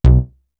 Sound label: MOD SAW; East West - Global Sounds; PROC.BASS